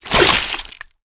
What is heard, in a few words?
Web Shot; Lurker; Evolve; Gorgon